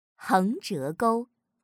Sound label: stroke